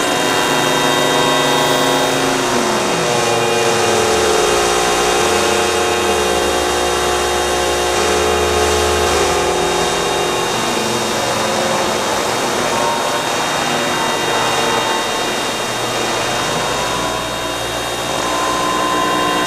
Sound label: Above Dome Static; Boomtown; Titanfall 2